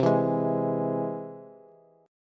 C Augmented, Bass, chord; strummed; x,15,14,13; JGuitar

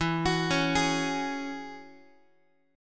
E 6th Add 9th E down Guitar; E6add9 arpeggiated; JGuitar

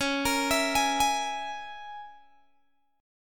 C sharp Minor 6th C sharp, Guitar Open G

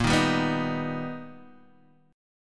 B Augmented Major 7th A sharp

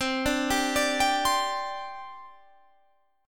C Suspended 2nd, C, down, Guitar, Standard; Csus2 arpeggiated

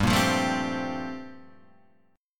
G Minor 11th down Guitar Standard; Gm11 strummed; JGuitar

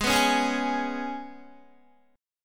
G sharp Minor Double Flat 5th G sharp, Guitar; G#mbb5; strummed; JGuitar

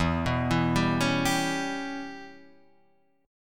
G 6th Flat 5th E

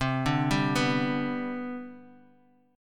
C Major 7th Suspended 2nd C down, chord

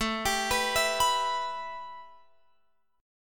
A 7th Suspended 2nd, A, down, Guitar, Open F; A7sus2; arpeggiated

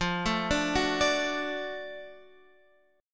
F sharp Minor Major 7th Sharp 5th

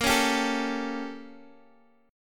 A 7th A sharp, chord; A7/A#; strummed

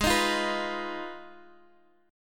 Ab Minor 6th Ab, down, Guitar, Half Step Down

